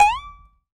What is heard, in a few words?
cuddle2